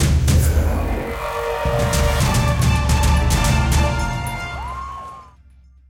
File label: Table Steaks (Transition); Cooking Dash